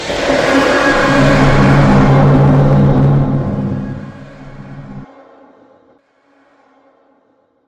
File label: dragon roar sound effect